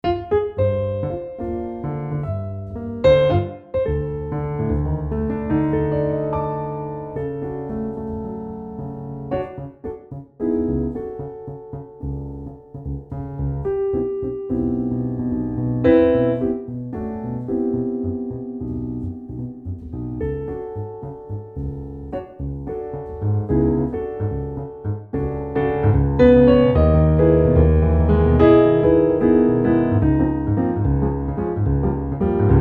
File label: CFX 3 Mics Aud, garritan cfx concert grand; garritan cfx concert grand aria player presets